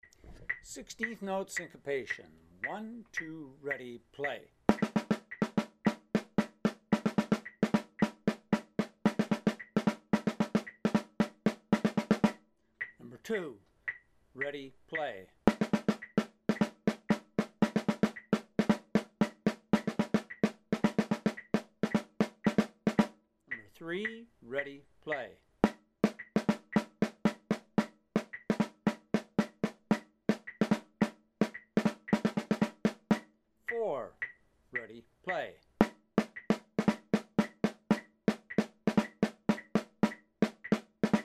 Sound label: sixteenth note syncopation